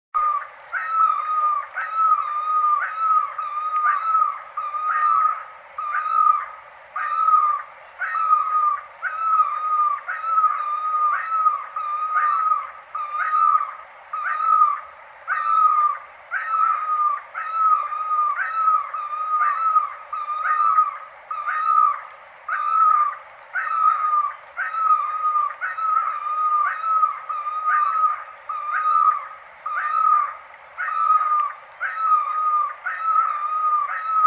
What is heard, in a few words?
great barbet